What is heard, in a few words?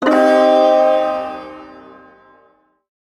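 Shawzin Pentatonic Minor Chord; Warframe; Duviri Ersatz Shawzin; Chords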